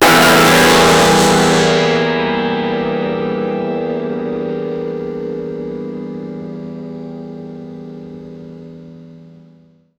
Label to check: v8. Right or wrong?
Wrong